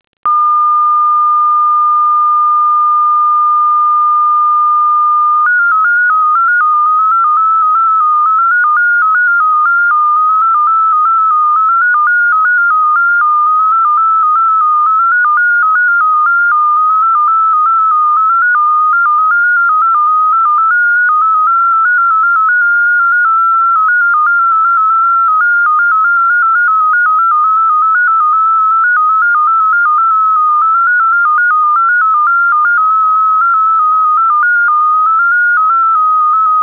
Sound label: MFSK-8, 8-TONE 250 Bd WAVEFORM